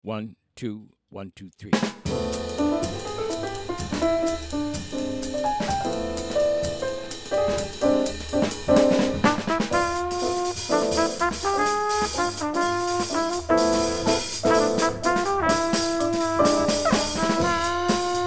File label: minus Bass